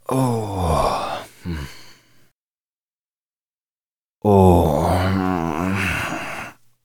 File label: Male Tired Exhales